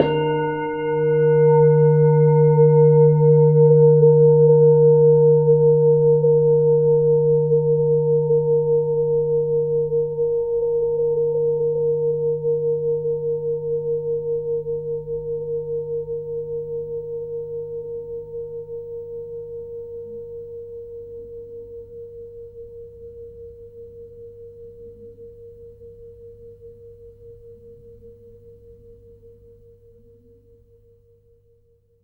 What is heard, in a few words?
Tibetan Bowl